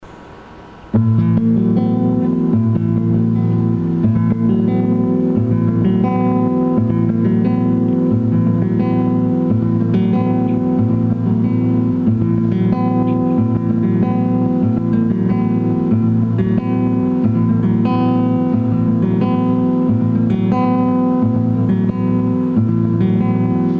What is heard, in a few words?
Guitar